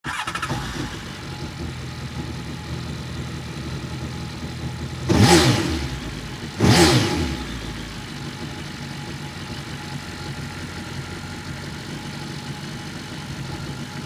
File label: Jet Ski Idle, Watercraft, Honda, Aquatrax, Start, Rev, Short, Off, Engine, Cover, Removed; Transportation; Boat